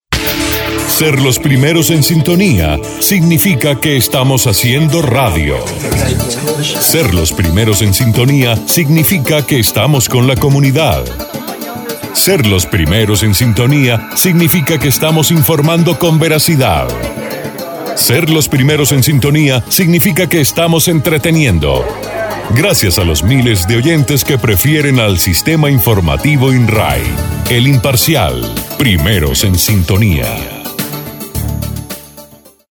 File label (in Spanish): promo imparcial primeros sintonia